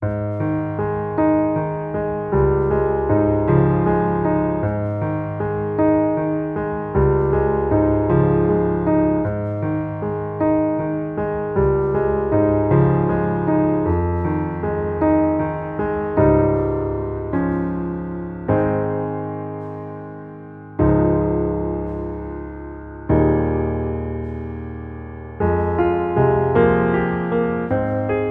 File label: Underscore PIANO; Worship Flow